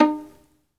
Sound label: SOLO VI, Synclavier Strings; ILIO